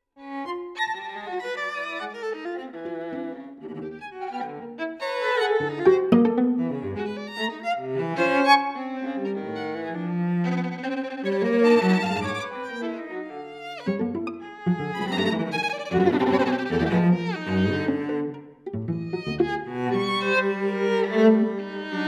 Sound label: String Trio; Milton Babbitt; violin; viola; cello; recorded by Erik Carlson at Marine Street Beach Studios